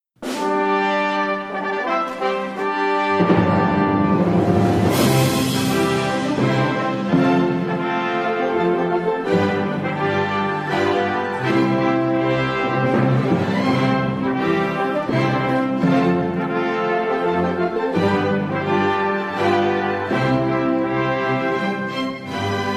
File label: Gaudeamus igitur instrumental